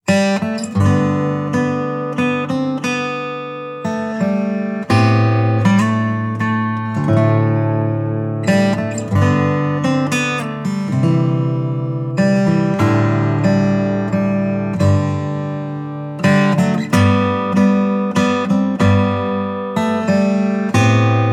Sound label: Celtic Guitar; Guitar Techniques 2019 Issue 296 June